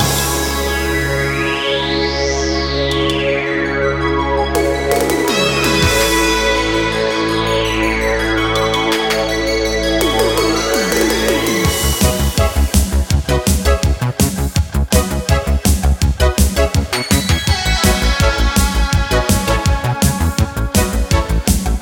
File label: ambience Music; hotline2